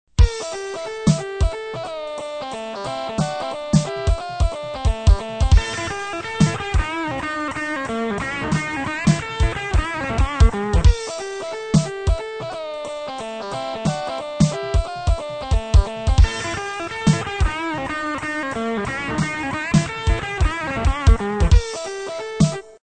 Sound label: Swung 8th notes, Swing 4/4 time, 180 bpm